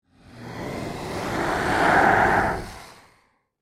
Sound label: Monster Werewolf, Horror, Monster, Werewolf, Snarl, Growl; Monsters